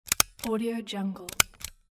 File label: stapler